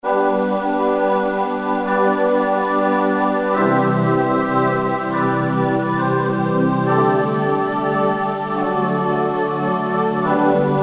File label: CH Modrn, Yamaha TG33; Factory Presets